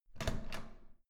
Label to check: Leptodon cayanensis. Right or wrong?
Wrong